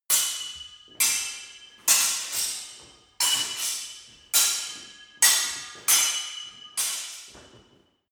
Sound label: sword hit sword sequence